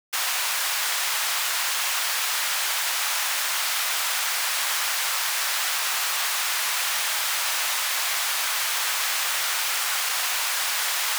Pink noise bands and phase check, High mid 1 kHz & phase check